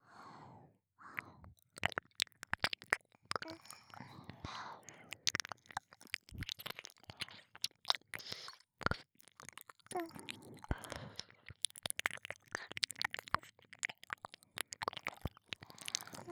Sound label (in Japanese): 両耳 耳舐め, ループ用耳舐め